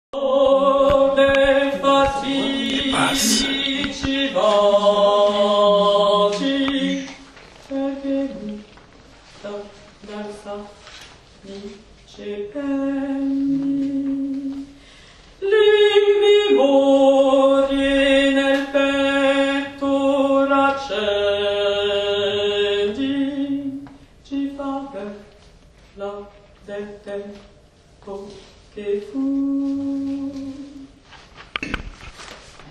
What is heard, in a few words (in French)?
Basses; nabucco